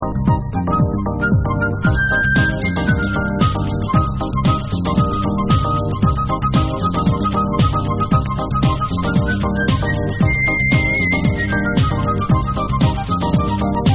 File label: Flute Mix